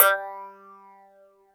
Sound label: THROATY, PHASE; Synth